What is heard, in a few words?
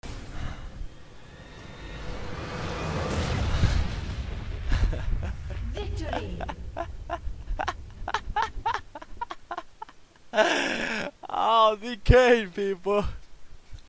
victory laugh; Trick2g-Soundboard